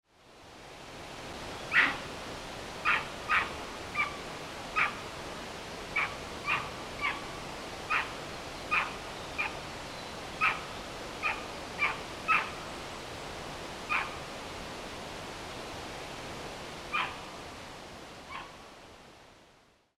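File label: Japanese macaque; oyamazawa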